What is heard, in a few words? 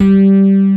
RHODES; Pro-Rec - Synthsonic